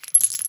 revolver clip out; weapons; Counter Strike Model Pack; CS:GO

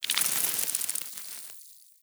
swarm small, cockroaches; critters

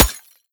glass, bullet impacts; physics; Half Life Model Pack

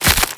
foley, zombie revived; scripted intro; Half Life Alyx

